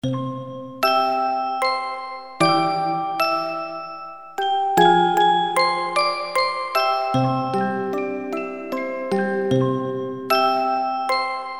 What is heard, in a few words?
musicbox